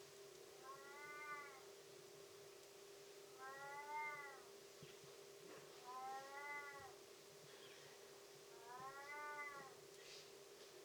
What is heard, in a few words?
european wildcat felis silvestris; myaukanya koshki